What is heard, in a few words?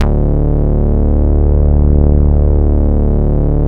MOOG D2MF; 90S MOOG